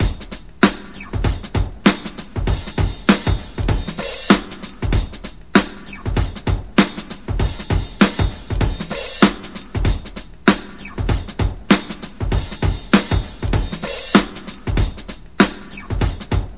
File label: 97.5 Heavy Pedal, Breaks; Lockdown Breaks